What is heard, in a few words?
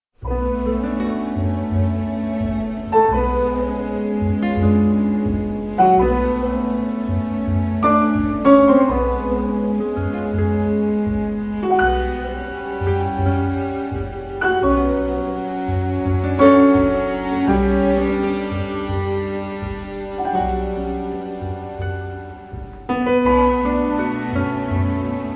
tango